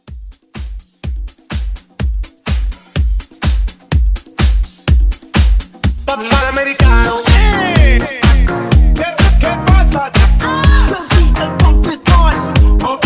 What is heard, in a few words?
house, 125; House Hype Re-Drum